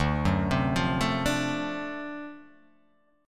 D 7th Sharp 9th D; D7#9 arpeggiated; x,5,4,5,5,4,4,x; JGuitar

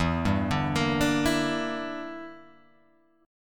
E Minor 7th Flat 5th E, Guitar Open G

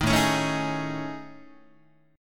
A Major 7th Flat 5th, down Guitar; AM7b5; strummed; 6,5,4,7,7,7

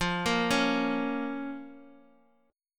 F Suspended 4th Sharp 5th F; arpeggiated; x,20,20,18; JGuitar

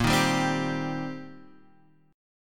C Minor Bb, Cm/Bb chord; strummed; JGuitar

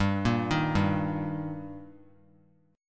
G Minor Sharp 5th G, chord; arpeggiated; JGuitar

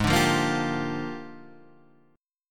Bb 13th Ab

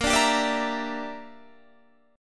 E Minor 7th Flat 5th A sharp

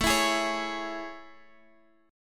A Major 7th, Guitar Open G; chord

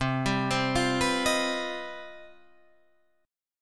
C Minor Major 11th C down Guitar Drop D

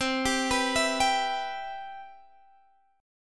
E Minor C

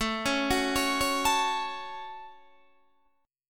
A 6th, Guitar, DADGAD

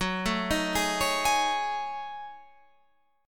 F sharp Minor 6th Add 9th, Guitar Open F; F#m6add9 arpeggiated; 18,16,15,15,16,15; chord shape

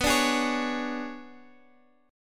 B Minor 6th Add 9th B, Guitar, Drop D

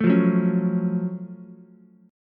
Gb Minor Major 7th Gb down Ukulele; strummed; JGuitar

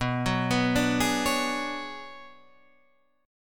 B Minor 6th Add 9th B down Guitar Standard; Bm6add9 arpeggiated; JGuitar

